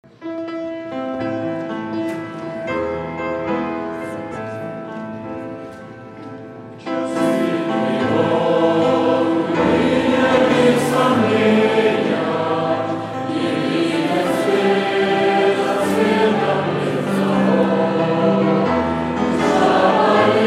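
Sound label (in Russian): Общее пение; V chasi trevog; Молодежное Сакраменто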